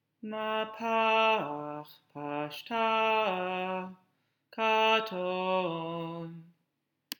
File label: Torah Trop Pattern